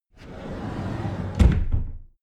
cupboard slide door close regular

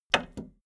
video tape player plastic stop click slow

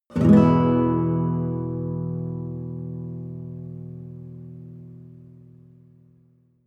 nylon guitar chord full major

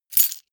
key ring large place

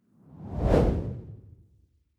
whoosh simple hard long

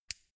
mini switch turn off hard

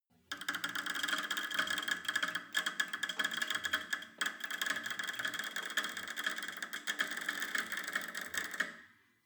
cable machine creak up

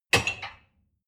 dishwasher cup place

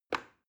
plastic button start release regular

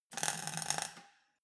weld sparkle short

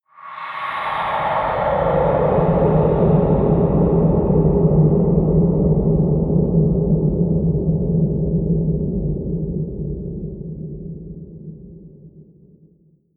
air sweep long deep

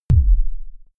sub kick noise short

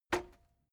cassette eject push heavy

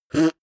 beatbox effect suck in damped

